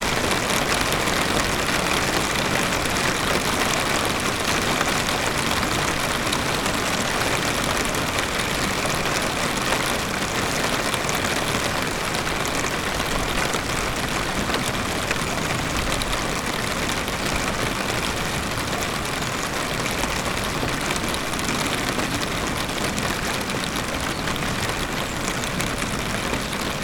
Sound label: weather medium rain on plastic roof